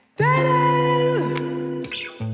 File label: tadow